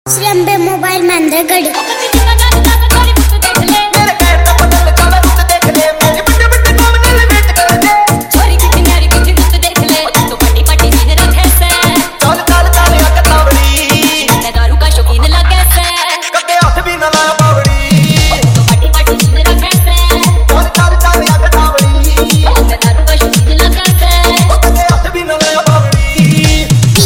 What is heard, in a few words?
Fast Hard Bass Vibration Punch Remix Haryanvi Dj